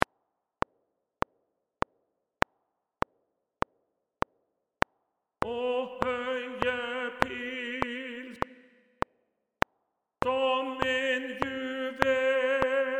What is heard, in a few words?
Tenor 1